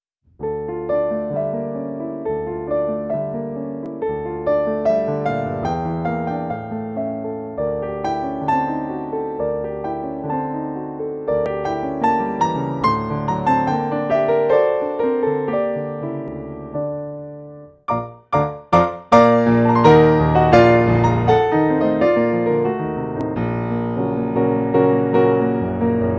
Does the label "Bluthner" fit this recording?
Yes